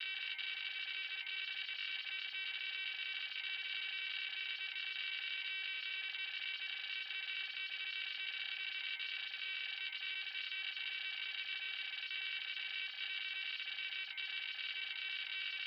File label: intro typing